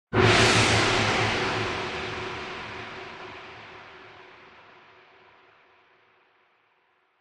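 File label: Gong Gong Single Hit, percussion gong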